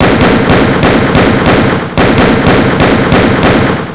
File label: 44mag, Gunshots!